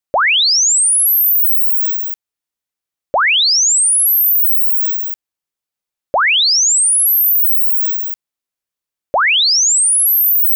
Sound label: sweep